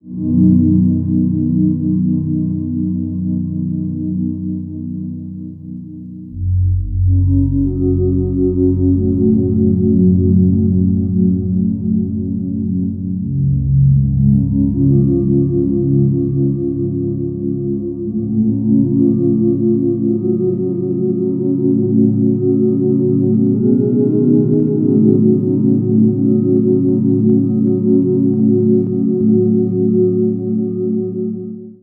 Ambi Cloud, Keyboards

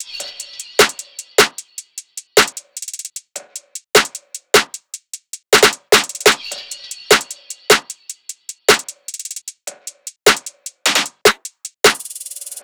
loop top 152, Seismic Loops

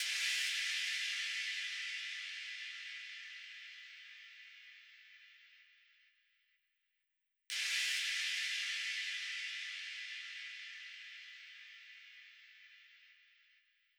FX Downlifter, 128BPM; Multi Drum Loop 02

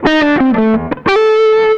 SOLO; Univers Sons; Jazzistic

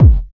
Hip Hop Kick; Roland BK-5

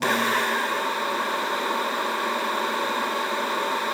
luft, alto sax; Xsample VOL-2

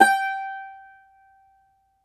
cumbus saz G, plucked strings

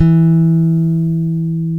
E-3 I-BS, bass; AKAI S-Series CD-ROM Sound Library VOL-8

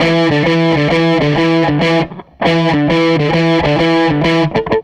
SG Guitar Loop

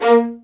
MED ATT A#, soft violins; Q Up Arts; Denny Jaeger Master Violin Library

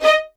D#5, LOUD VIOLINS; Q Up Arts - Denny Jaeger Master Violin Library

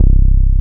SUB BASS; Techno Trance; Synth Basses; E-MU Formula 4000 Series Vol. 2

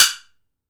woodblock; AKAI S-Series CD-ROM Sound Library VOL-5

